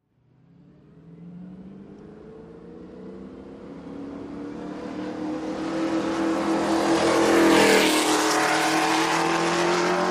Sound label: Sports Cars Away CU